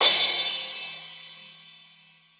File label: crash single hit e key; Cymbal; drum sample; one shot